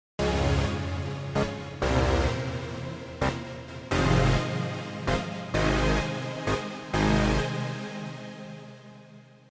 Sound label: Synth